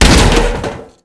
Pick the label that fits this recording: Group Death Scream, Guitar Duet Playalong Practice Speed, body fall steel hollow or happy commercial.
body fall steel hollow